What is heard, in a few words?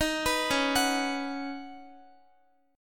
Db 7th Suspended 2nd Suspended 4th, Guitar; Db7sus2sus4; arpeggiated; tuning=4F,4A,5C,5G,5C,6E; x,x,15,16,13,14